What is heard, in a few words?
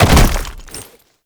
Land Heavy Rock; Movement; Warframe; Uriel